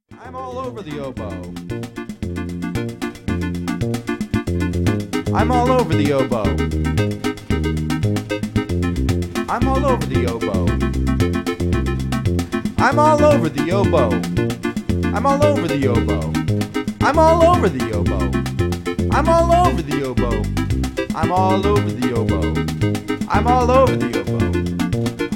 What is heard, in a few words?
oboe